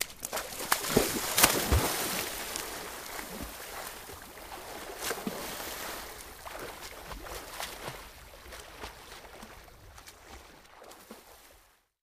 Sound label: Person Run Diving, swimming